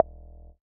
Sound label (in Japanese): ポン コミカル